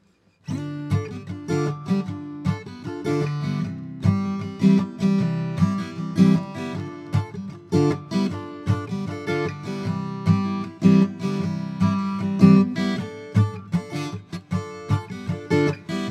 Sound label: Walnut 00 sample, guitar